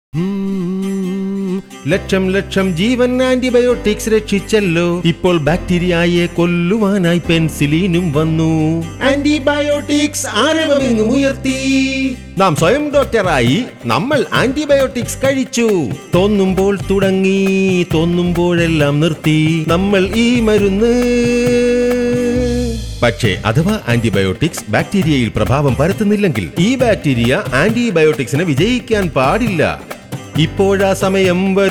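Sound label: Antibiotic Jingle Malayalam; jingles in 19 languages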